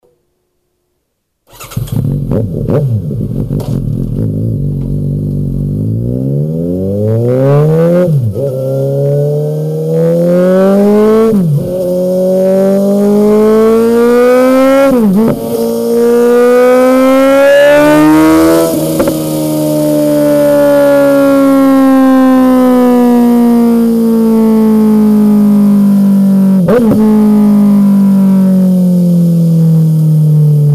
SUZUKI GSX S, exhausts

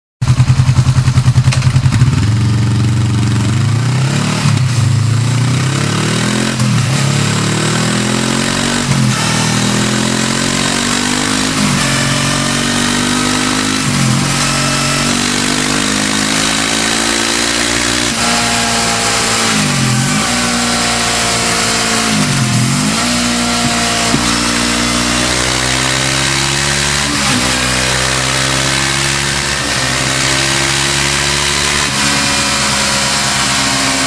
HONDA CB500F

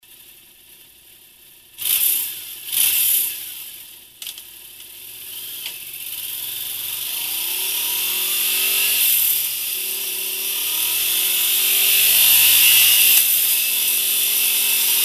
YAMAHA MT07 TRACER STOCK, exhausts

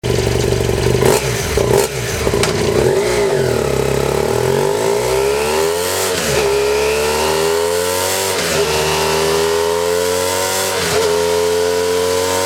BMW F800R STOCK, exhausts

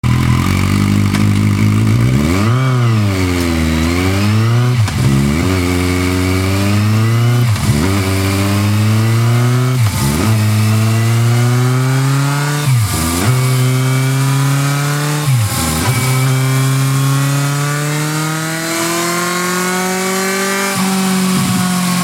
KAWASAKI Z800 E GP, exhausts

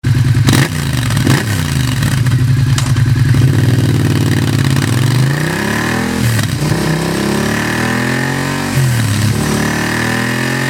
YAMAHA MT 07 GP

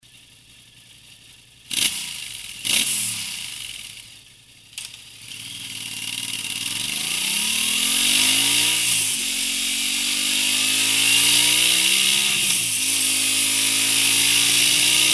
YAMAHA XSR 700 OVAL, exhausts

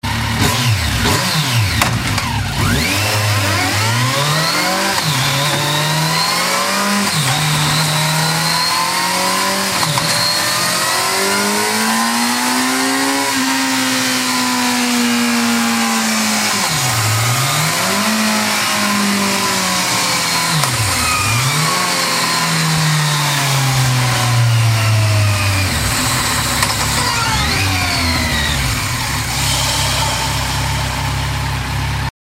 KAWASAKI VERSYS 1000, exhausts

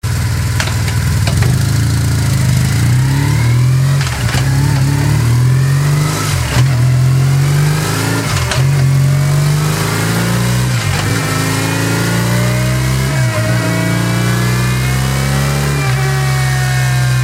BMW R1150GS OVAL

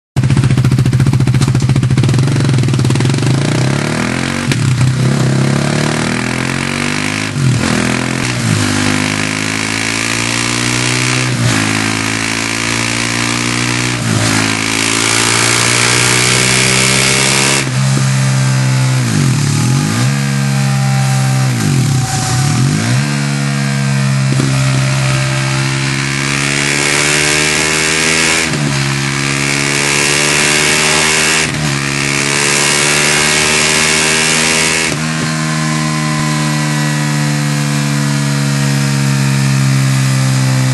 KTM DUKE 390 GP

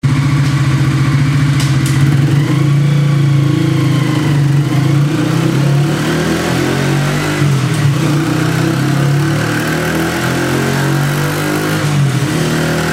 HONDA CBR125 GP, exhausts; 04 06

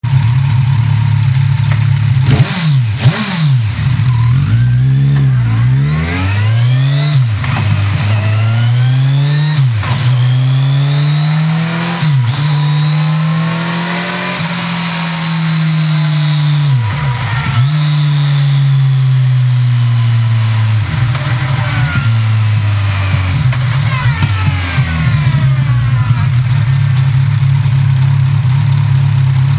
KAWASAKI Z1000 GP, exhausts

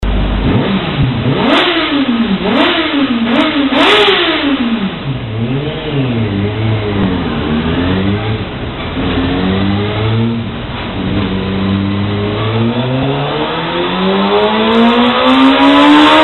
KAWASAKI ZX6R OVAL

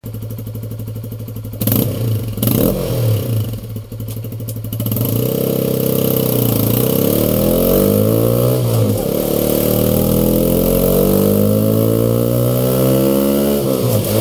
HONDA CBR 125

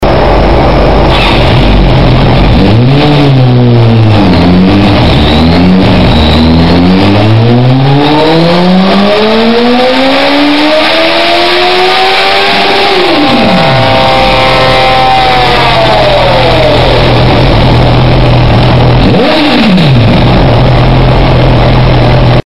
SUZUKI GSF 650 BANDIT OVAL

